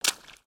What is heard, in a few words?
Foot Swamp Water Run; footsteps